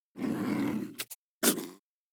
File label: wolf bored; LoL; monsters